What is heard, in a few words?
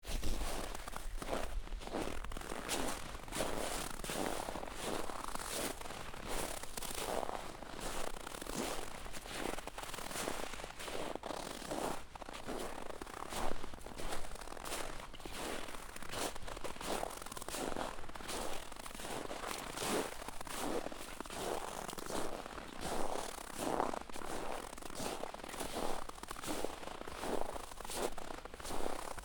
footsteps snow; foley